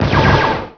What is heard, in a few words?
laser; AC Demo